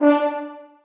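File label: horns staccato c#4; Sonatina Symphonic Orchestra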